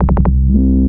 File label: combat scan; scmod